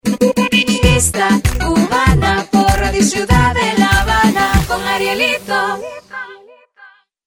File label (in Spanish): jingle pista cubana